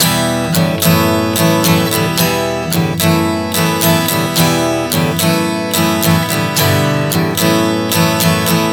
Em-F#m11-G-F#m11 110 bpm, acoustic guitar chord progressions